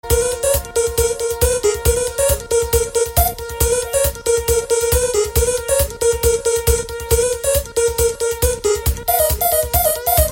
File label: Digital Tone